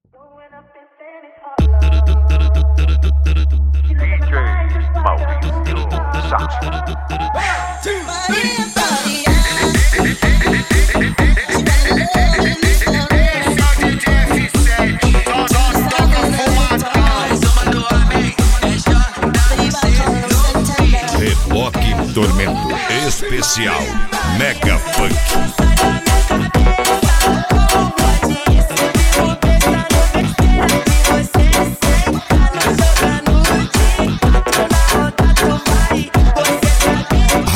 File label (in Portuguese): mega funk